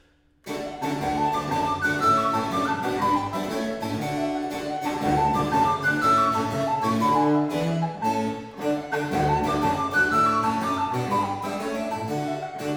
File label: Partita No. 6 in E Flat Major Allegro; TWV 41 Es1